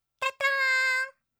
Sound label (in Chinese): Windows開始